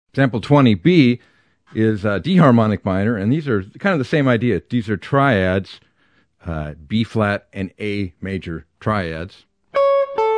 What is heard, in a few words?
Harmonic Minor; Don Mock